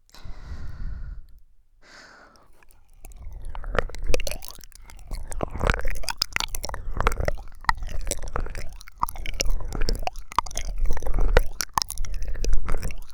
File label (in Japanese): 耳中くるくるコース